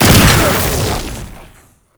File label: Concrete Archgun Projectile Empowered Start; Warframe; Archwing